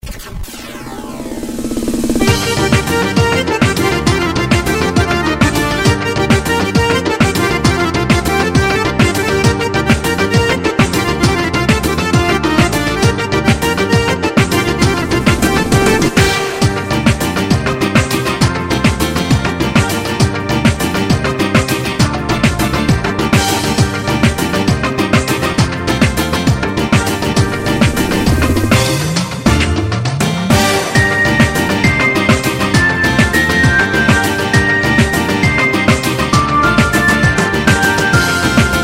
Djembe

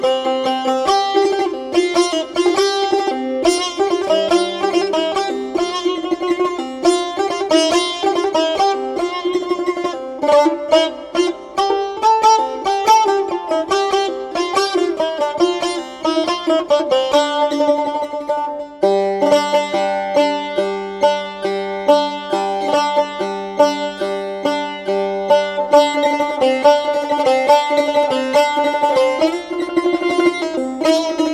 Setar

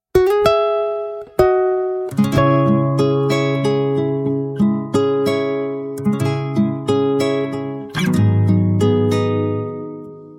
Guitar Version

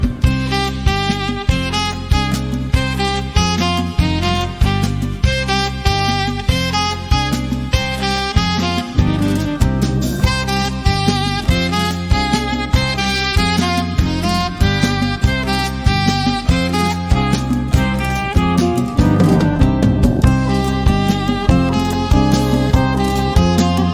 Saxophone

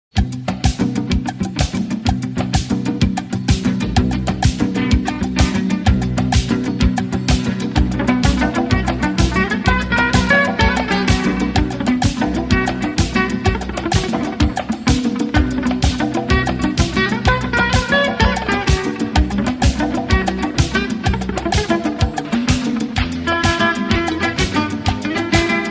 Remix Electro Guitar

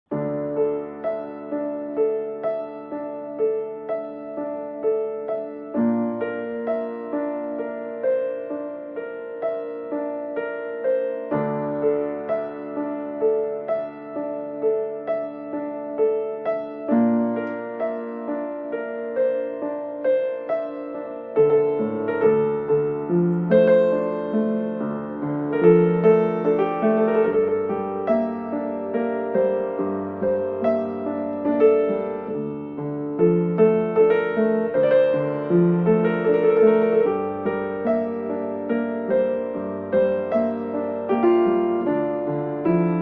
Piano

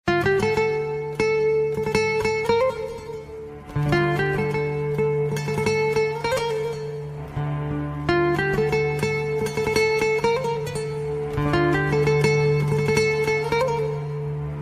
Guitar

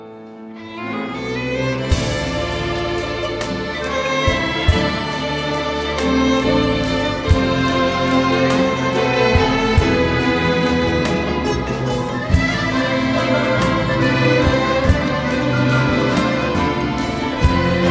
Concert

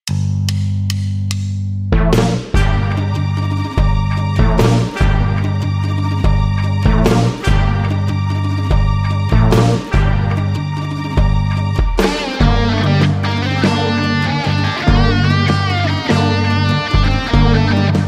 Remix Electric Guitar

